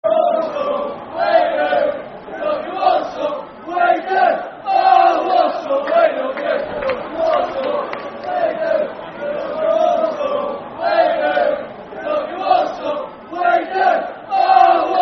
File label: We Love You Walsall, Football Chant (Soccer Song)